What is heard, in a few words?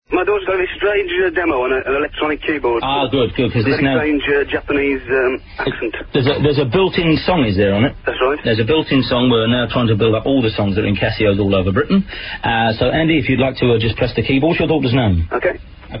casio